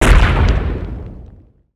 Launcher Point Cast, bullet

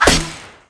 Bthrow Metal, Damage

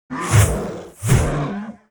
attack; paimon

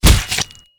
Staff Scale, Damage

